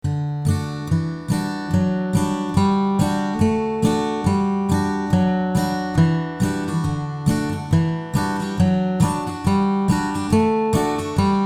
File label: aad scale